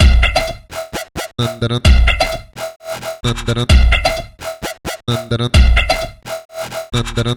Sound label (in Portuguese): TAMBORZAO; MEGATRON